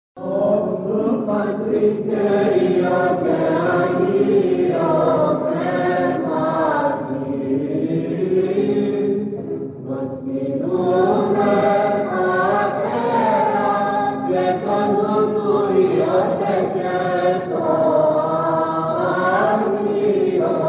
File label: Choir